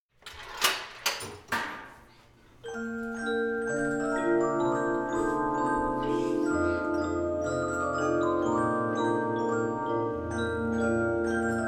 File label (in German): nachtigallenschlag, polyphon; weltemignon